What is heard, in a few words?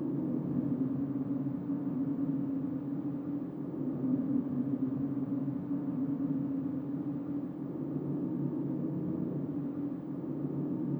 Fly Backward; Google Earth VR